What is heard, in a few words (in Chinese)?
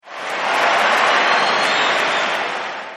短暂的欢呼; 战中; 战斗音效; nba